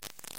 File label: aaaamen, aaaamen, a-amen, amen, amen